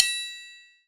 bing, Triangle; Misc Percussion

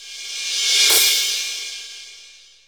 reverse cymbal

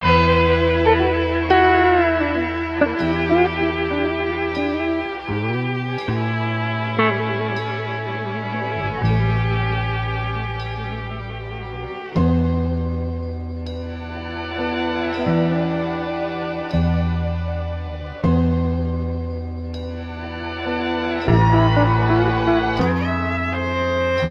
strings, Sad Loops

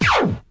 laser blast; Zaps and Beeps

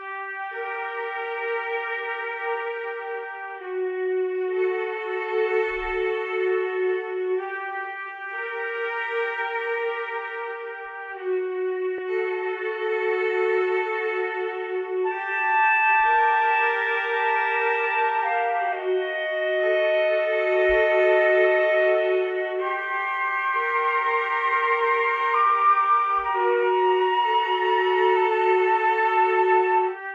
strings, Sad

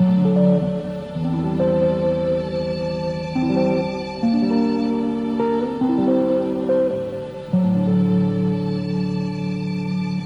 keys broadway

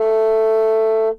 bassoon; Winds

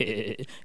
laugh

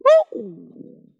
"woo", male

